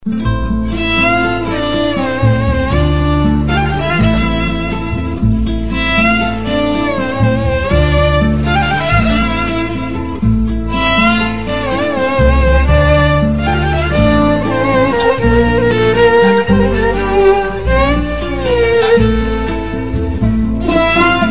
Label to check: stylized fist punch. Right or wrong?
Wrong